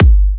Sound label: G, kicks; markus hakala kicks